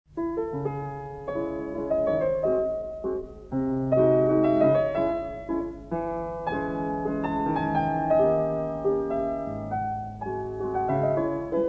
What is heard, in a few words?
Chopin Mazurka cis